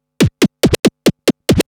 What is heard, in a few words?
Scratch Fills 140BPM; Vengeance - Essential Clubsounds Vol.4